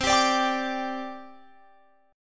C Suspended 2nd, C, down, Guitar, Open F; Csus2; strummed

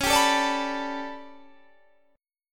A 11th Db, guitar chord

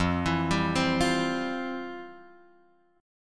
F Major 7th Suspended 2nd, F, guitar; FM7sus2 Chord {1 X 2 0 1 1} arpeggiated

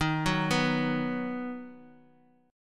Eb Minor Sharp 5th; Ebm#5 arpeggiated; JGuitar

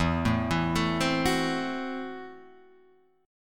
F sharp Minor 7th Flat 5th E

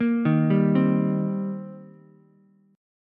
C Minor 7th C Ukulele; Cm7 arpeggiated; JGuitar

